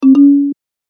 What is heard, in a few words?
notice minor